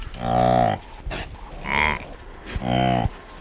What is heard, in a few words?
Saiga tatarica male; sad